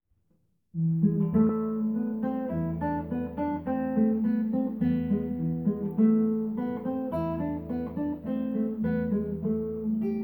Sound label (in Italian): CHITARRA SOLISTA Voce